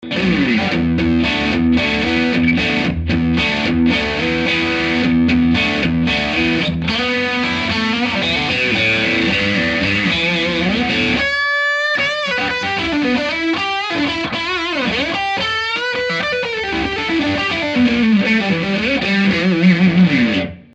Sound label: overwound brett bridge middle; schwarzbach